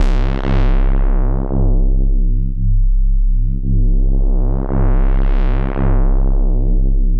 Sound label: BASS C2 L, Jungle; JGL BASS; USB Soundscan vol.05; Explosive Jungle; AKAI; 90s sample CD